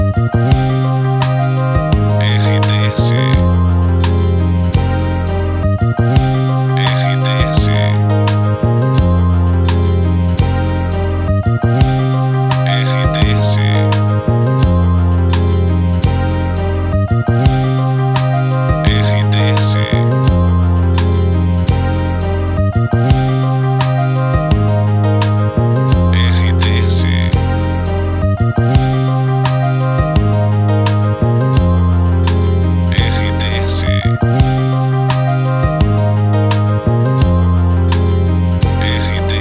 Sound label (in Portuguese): trilha para locução adulto; Web Net; Web Rádios